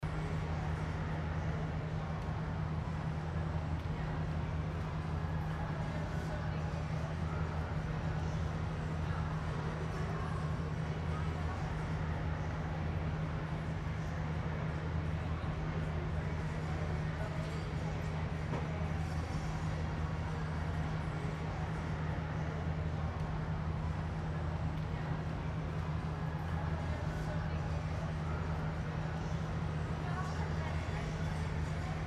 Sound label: Mall Ambience